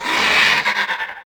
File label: drake vox att